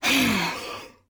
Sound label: Woman Frustrated; voice; Zomboid; Lifestyle